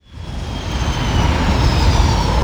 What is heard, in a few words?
in air start intense